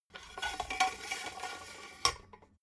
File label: metal scaffold pole slide; industrie-wirtschaft